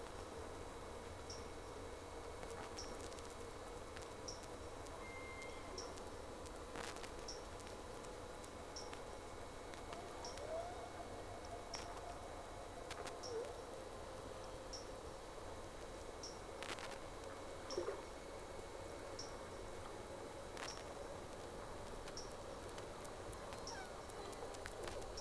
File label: Whales; SeaSnake